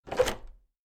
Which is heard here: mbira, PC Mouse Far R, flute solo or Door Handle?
Door Handle